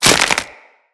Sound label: Stealth Neck Snap; Tenno